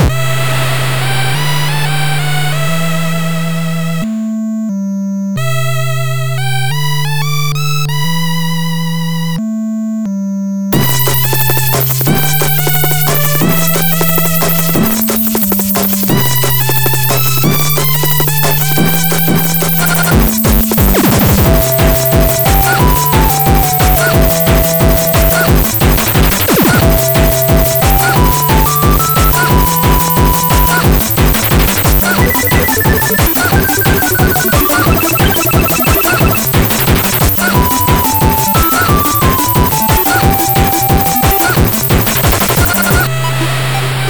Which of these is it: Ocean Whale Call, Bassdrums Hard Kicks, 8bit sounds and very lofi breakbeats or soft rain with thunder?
8bit sounds and very lofi breakbeats